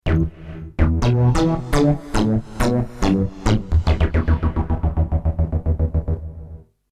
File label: hi-nrg bass